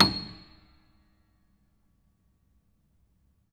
Note High, Piano; Piano Miscellaneous

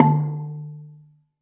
Gambang Soft Beater, Gamelan

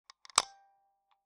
Taxi Meter

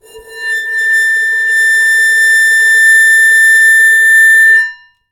Bowed, Large Wine Glass

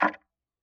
Tick, Clocks Kitchen Clock

